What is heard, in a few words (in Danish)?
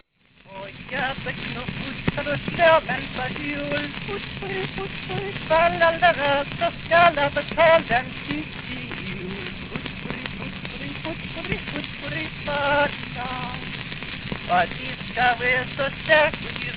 Folkesang; Dansk Lydhistorie Statsbiblioteket; 1907